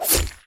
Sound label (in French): tranchant